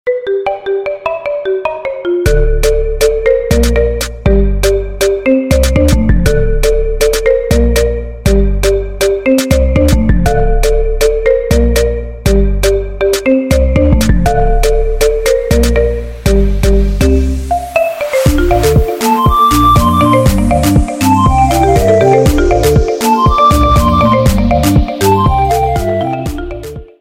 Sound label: marimba remix